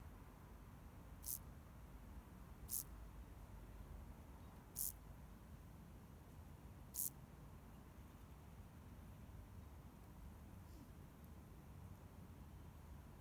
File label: Field Grasshopper